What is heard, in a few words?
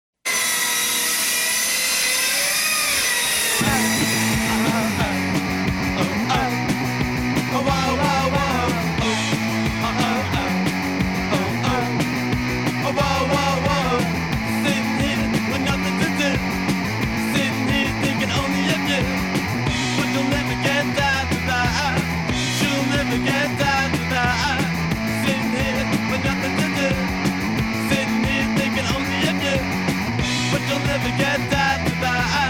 Chain Saw